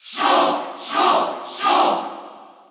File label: Cheer Russian; Shulk; SSBU